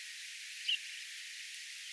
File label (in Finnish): hempon punatulkkumainen aani tietaakseni